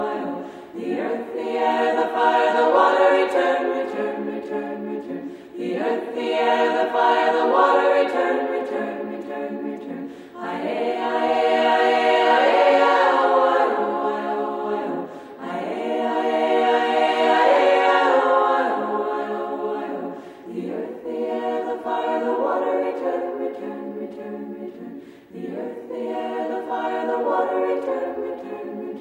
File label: wiccan chant; ringtones